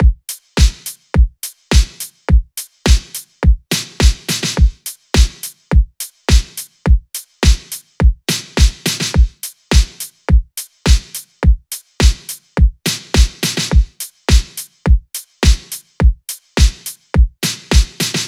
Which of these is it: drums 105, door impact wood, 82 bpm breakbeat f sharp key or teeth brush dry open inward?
drums 105